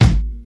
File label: present steel kick drum sample g sharp key, steel kick drum sample tuned to the G# note